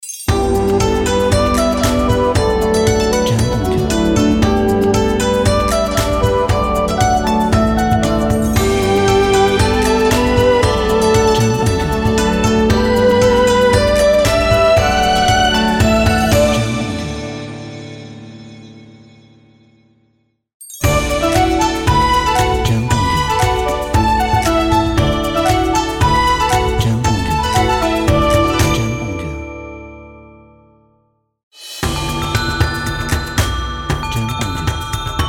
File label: Chinese Asian Logo; Chinese Asian Logo Pack